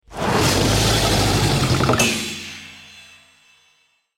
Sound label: FIRE RUMBLE; The Finals